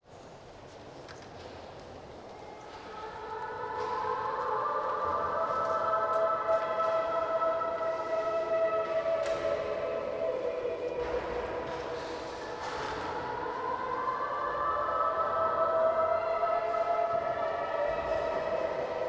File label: Cathedral Female Choir; St John